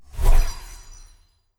Transmute

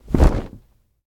Animals Monster Wing Flap Single Right

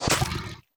Mawlek Spit; Hollow Knight (gamerip) (2018); Mawlek Spit B

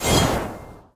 Sword Pierce

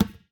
Physics Object Gym Ball Impact

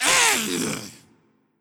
Small Death

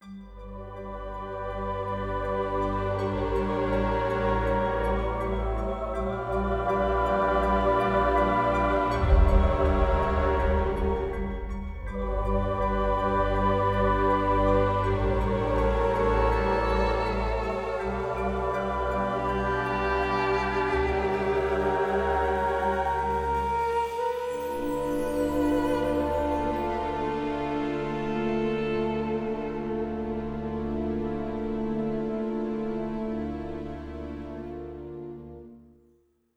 mountains music

